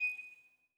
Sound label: Rose Hover; netent